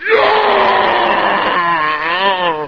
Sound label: krik